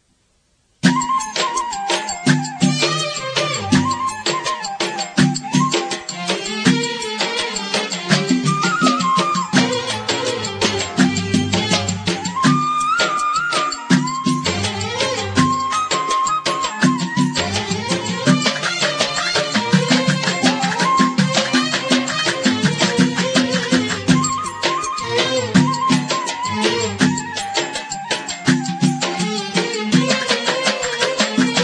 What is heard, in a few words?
music